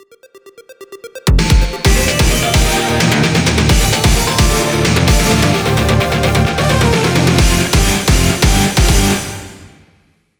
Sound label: level intro bit; Gravity Circuit